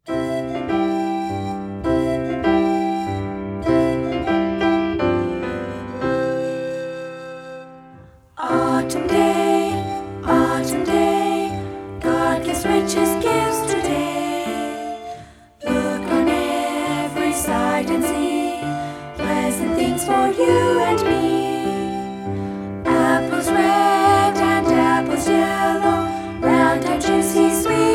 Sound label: words and music